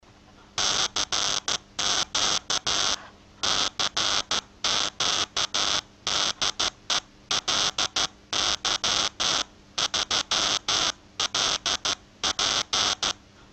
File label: spark, sounds and personalities of cw and transmitters; CW Learning Programs